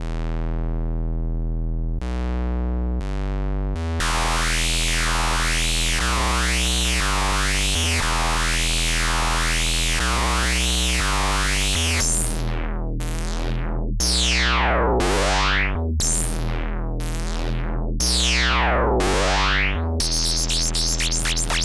Square Wave; Malfunction

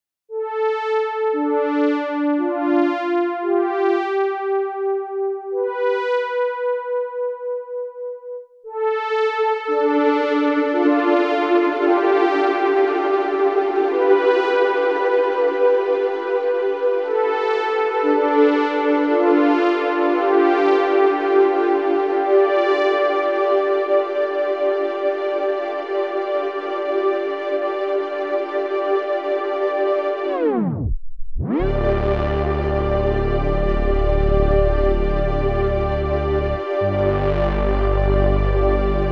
Saw Pad; Albedo

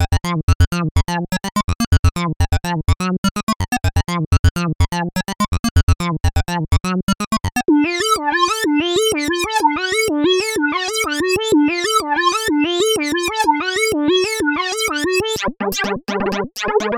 Synth Lead Presets; Alternator

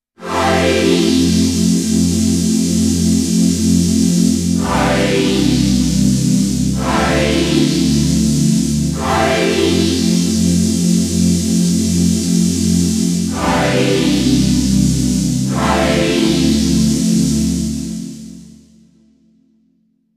KORG Odds Hybrid; prologue

